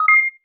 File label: Computer Data, Sci-Fi